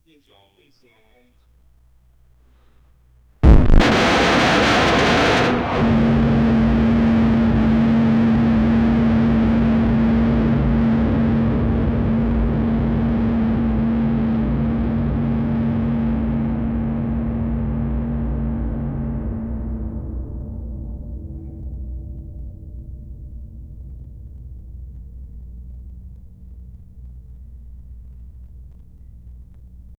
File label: futuristic cannon deep, distortion